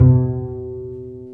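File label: STR Cb Pizzicato, strings; Cb Pizz 1; Roland L-CD702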